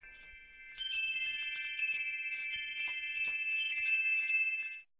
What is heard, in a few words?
plains